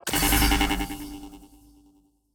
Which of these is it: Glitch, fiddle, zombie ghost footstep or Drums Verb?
Glitch